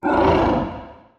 T rex growl; soundscrate

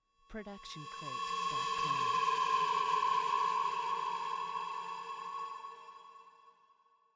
scifi gleam

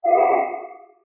dinosaur grunt; SoundsCrate

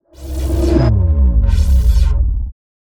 Bass Drop; SoundsCrate

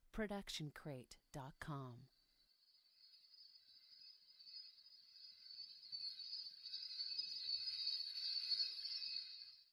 reverse shimmer no tail; soundscrate

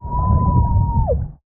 underwater scream female; soundscrate

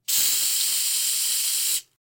aerosol can spray

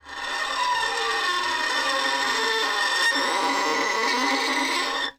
Creaky Screetchy Noise Long; soundscrate

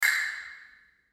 castanet single note

